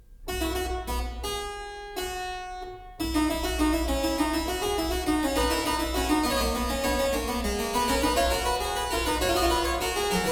Fugue, Well-Tempered Clavier, klavier; BACH; WTC 2; Fugue 7

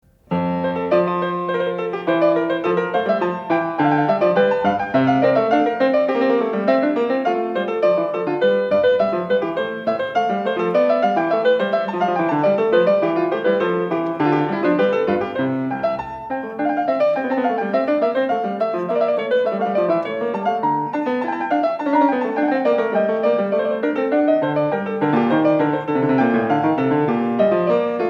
Sinfonia in F; BACH; SINFONIAS; BWV 794

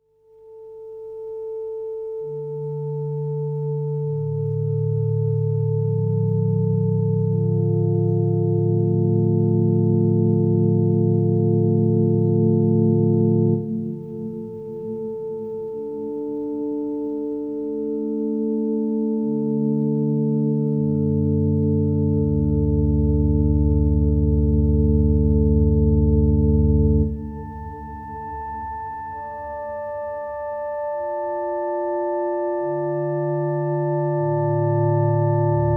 Subharmonic Passage; AMBIENT; Electronic Music Center; Zentrum Für Elektronische Musik

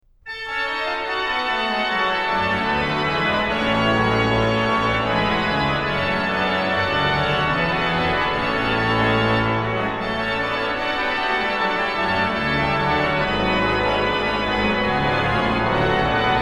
organ; BWV0630; Heut triumphieret Gottes Sohn; BACH; BACH ORGAN WORKS; CHORALE PRELUDES

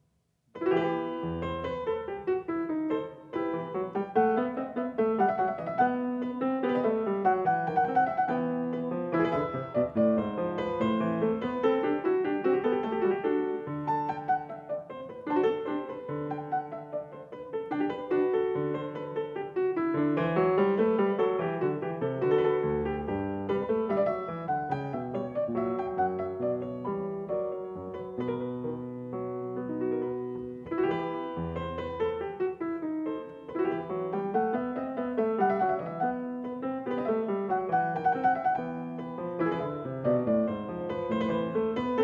Courante, PIANISTS; English Suite No. 5 in E minor; BWV 810; BACH; ENGLISH SUITES

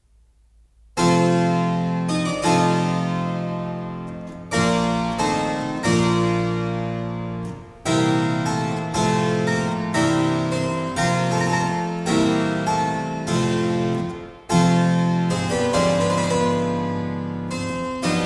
Sarabande, klavier; BACH; CLAVIER WORKS; English Suite No.4 in F major; BWV 809

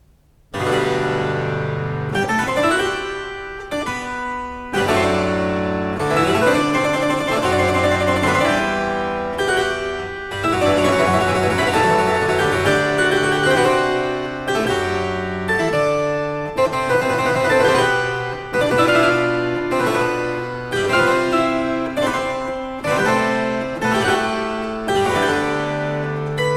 Variatio 16 Ouverture a 1 Clav, Cembalo; BACH; Goldberg Variations

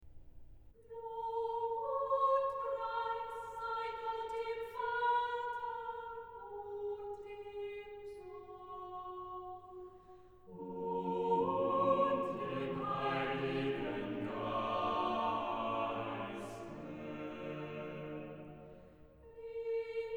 Chorale, ORGAN; BWV0324; Lob und Preis sei Gott, dem Vater; BACH; BACH ORGAN WORKS; CHORALE PRELUDES

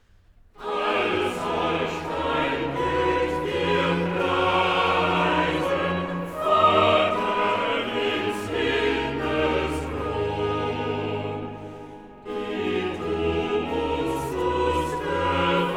Chorale (Chorus); All solch dein Gut wir preisen; Bach; Cantatas; BWV 16; Herr Gott, dich loben wir

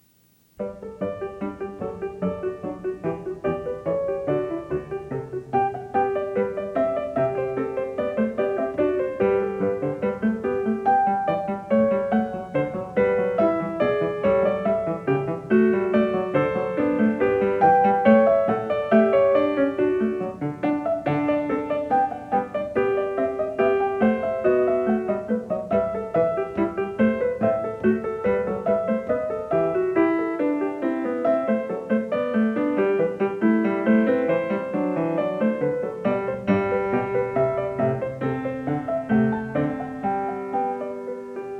Variation 1 a 1 Clav., PIANISTS

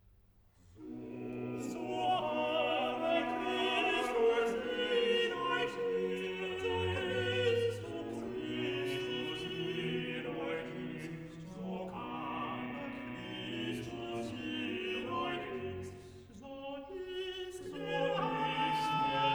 Motet; So aber Christus in euch ist; Bach; BWV 227; Jesu, meine Freude

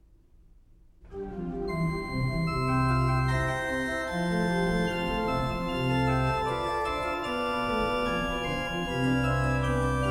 Prelude in C major, ORGAN; BWV545

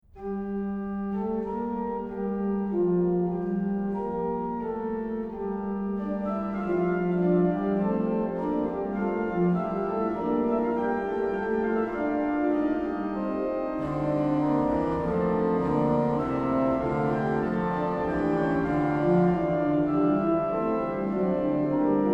Erhalt uns, Herr, bei deinem Wort, organ; BACH; BACH ORGAN WORKS; Chorale Preludes

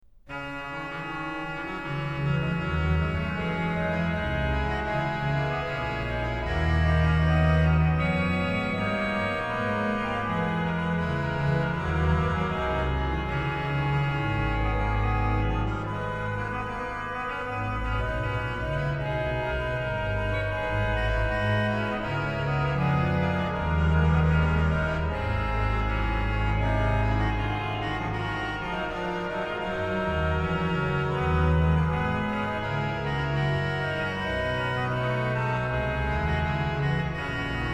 Mit Fried und Freud ich fahr dahin, organ; BWV0616; BACH; BACH ORGAN WORKS; CHORALE PRELUDES

